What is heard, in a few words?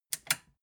metal button click power off fast